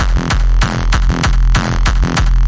hardcore triplet filter kicks, DRUM LOOPS and BREAKS, 192 bpm